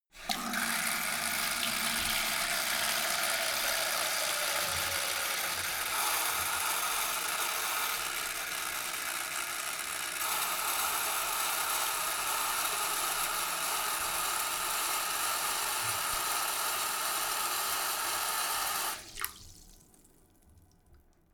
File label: sink fill medium long